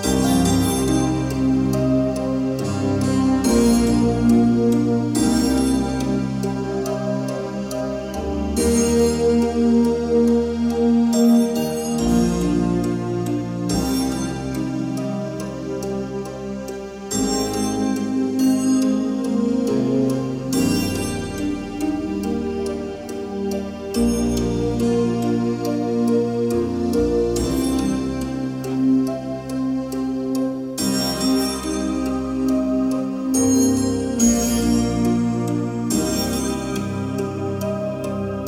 instrumental

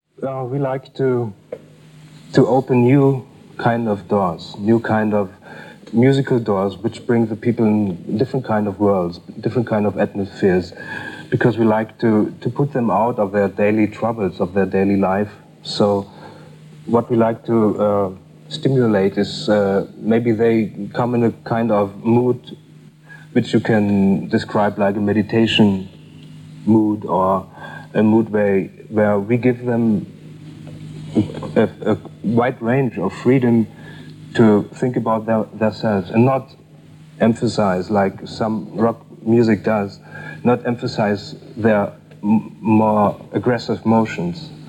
Musical Doors

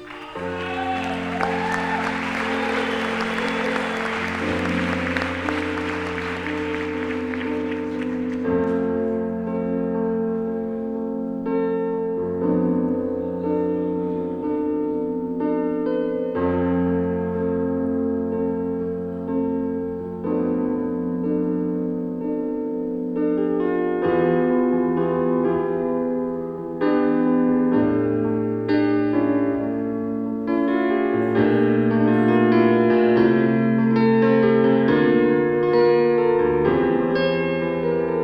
Papyrus [piano]

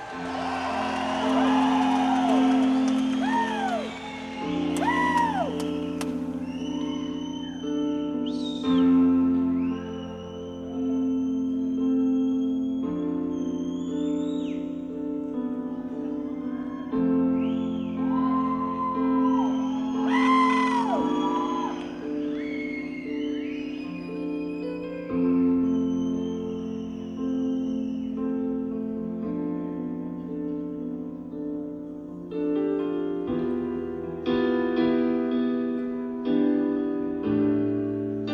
Piano Solo

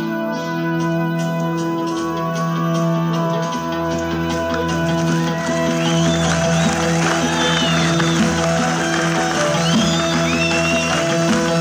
Diamond Diary, guitar part

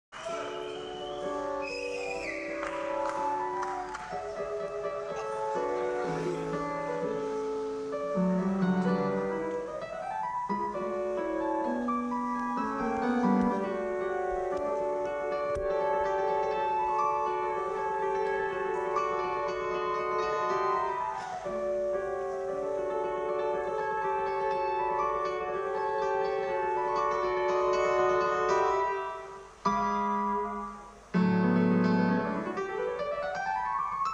Piano Concerto

